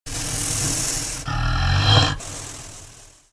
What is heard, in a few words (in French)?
blaireau